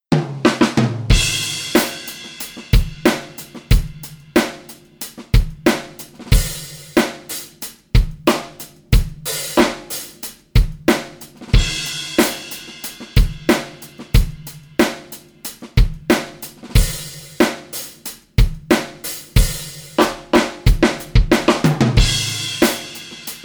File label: drum